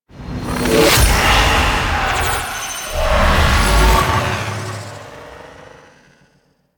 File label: Clone The Dead; Warframe; Necro